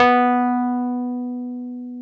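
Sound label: piano, Wurly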